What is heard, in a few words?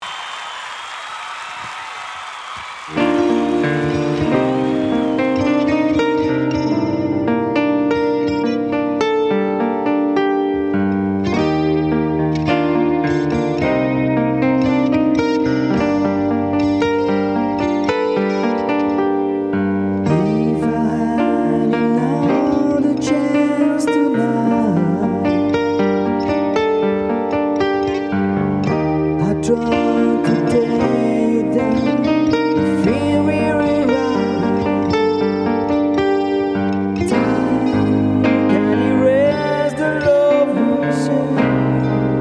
Acoustic Set; Lamalou